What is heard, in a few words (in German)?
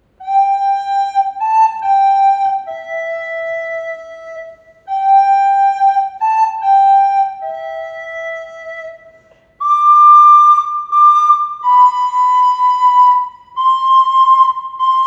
Stille Nacht Blockfloete